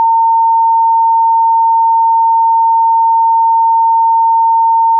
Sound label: 910 hz; 44 1Khz; 32bit; test-tones